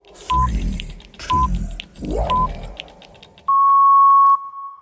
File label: lock chime; car locking